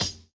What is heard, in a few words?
BD; Best Service; Dance Mega Drums